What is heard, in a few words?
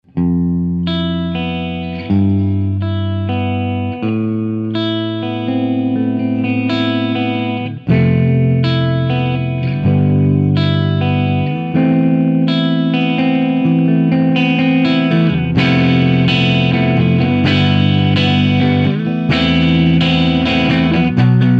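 Humb59 middle + bridge JTM45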